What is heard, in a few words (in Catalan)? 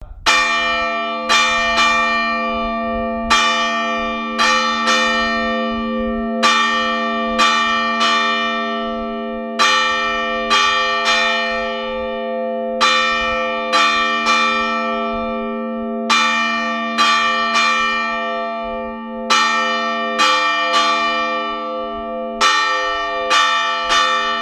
albat, toc, campanar